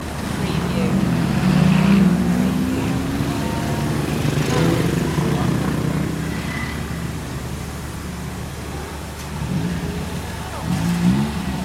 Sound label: bangkok street ambience loop